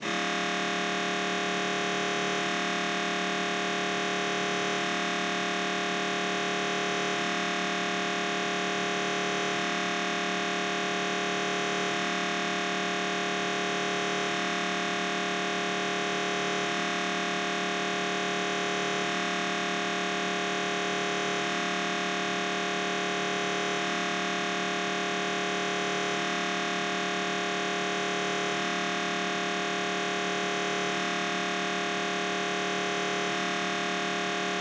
siemens 3t magnetom prisma fit whole body human scanner mri t2 swi